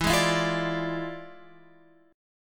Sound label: E 7th Flat 9th Guitar Open F, chord; E7b9; strummed